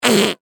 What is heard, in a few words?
Cartoon Whoopee Cushion Fart; Miscellaneous Cartoon Sound Effects; Miscellaneous Cartoon (Sound Effects:Cartoons); SFX Bible 6